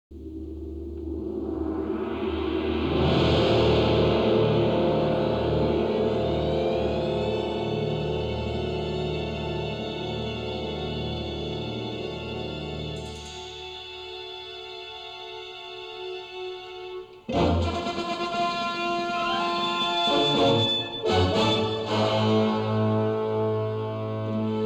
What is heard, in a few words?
The pirates organ